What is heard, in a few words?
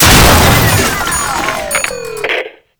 Tank Phonebooth Explosion; Enemies; Gameplay; Lasrian Tank; Warframe